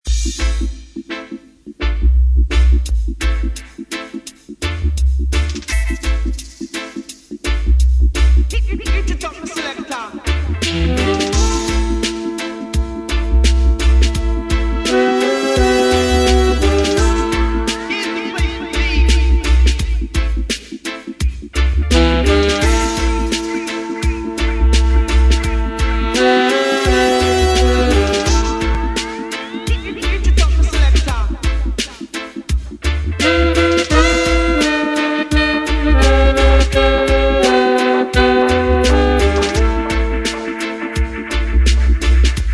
drum song

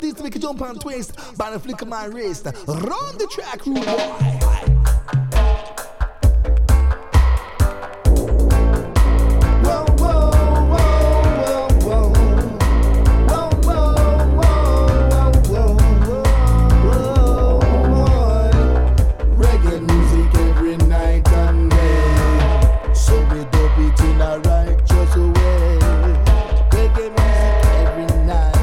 reggae music

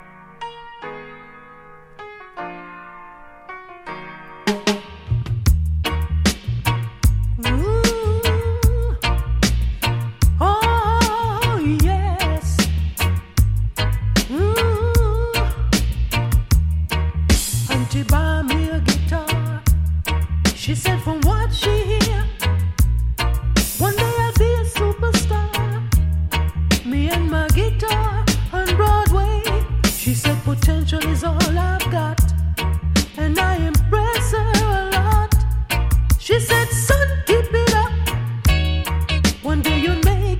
guitar

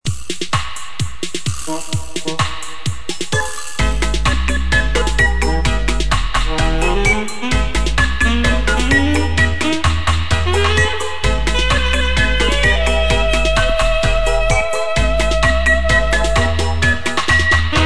sax

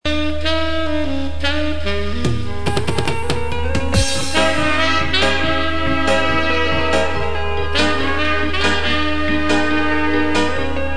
horns

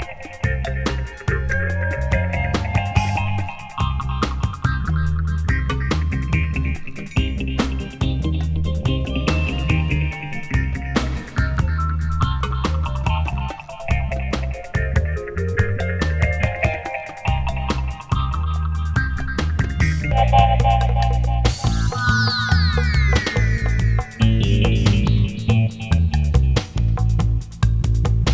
thunder mountain centry in dub

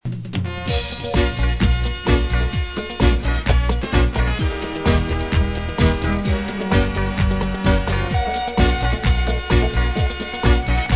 trombone dub

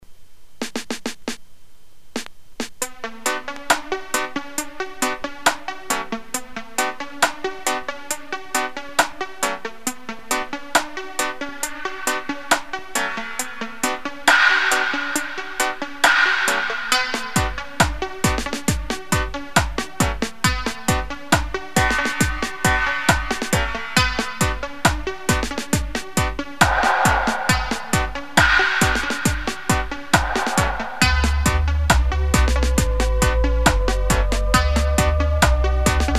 marching vibration dub

